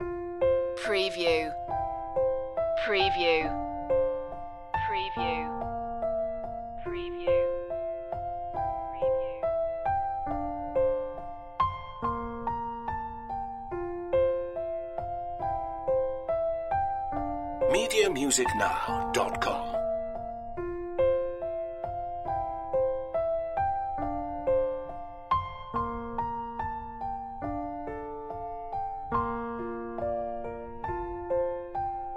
red roses piano only

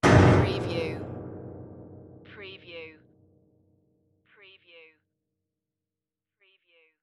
Piano horror smash; beanstalk audio

